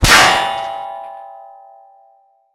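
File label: metal pipe snap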